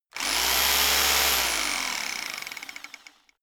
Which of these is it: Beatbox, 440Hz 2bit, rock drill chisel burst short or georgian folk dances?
rock drill chisel burst short